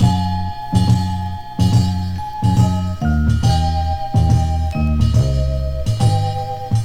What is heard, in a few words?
full, Loops Phonky